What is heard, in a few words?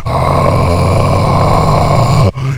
zombie voice idle